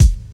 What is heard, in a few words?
treble rich kick drum F key